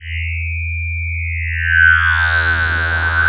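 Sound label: synth, Production Effects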